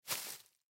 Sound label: Foot Step